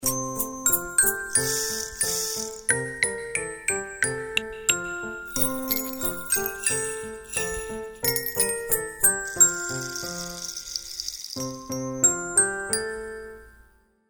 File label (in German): Lied in der Piano-Version mit Singstimme in tieferer Lage und langsamerem Tempo zum Kennenlernen; Lied ABC